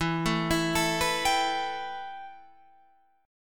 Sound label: A 7th Suspended 2nd, E